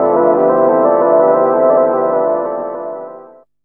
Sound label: DR. LOOP, TRANCE; X-Static Goldmine 3